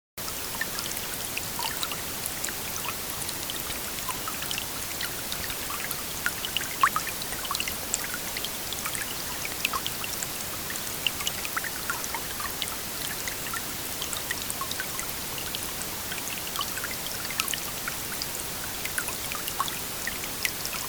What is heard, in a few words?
lower stream tinkle